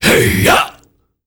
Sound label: HEYYA, AFRO NOISE, World Voices; ILIO; Vocal Planet VOL-5